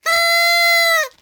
party blower luftruessel

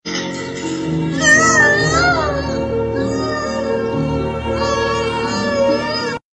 kid crying

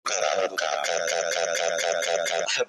brazilian kkkkkkkkkkkkkkkkkkkkkkk

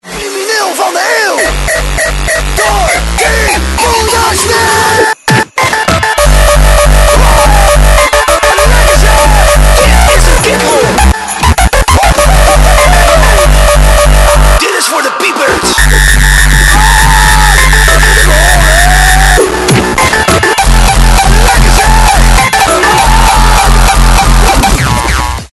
uptempo

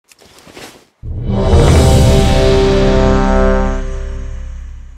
lfg sound; world of warcraft

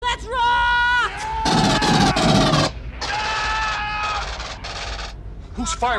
alien gunfire

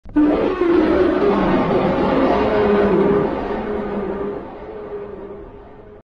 arrival sound; ripper

